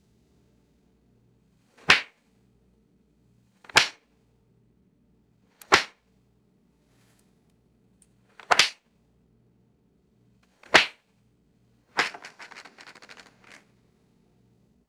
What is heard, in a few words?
playing cards dropping on wood; game